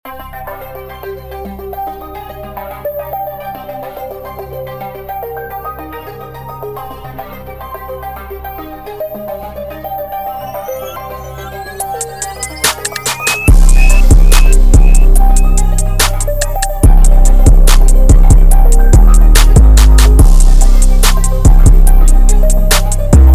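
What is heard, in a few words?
808 bass; serum 2 genocide; 2 8 expansion trap pack bundle purple edition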